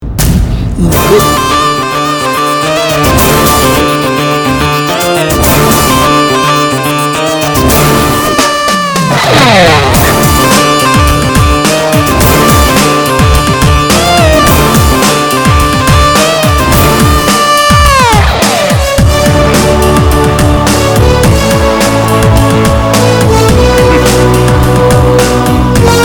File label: theme music